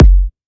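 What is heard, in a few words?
Kick, F minor; Sample Banks; Percussion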